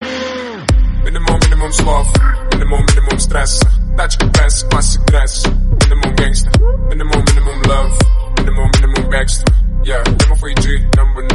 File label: best rock ringtone